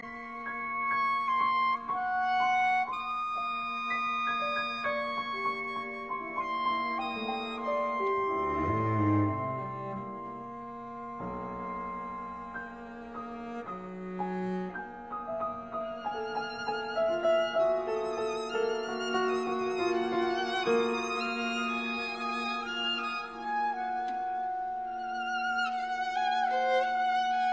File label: Quartet for Clarinet Violin Cello and Piano Lento e cantando; Solace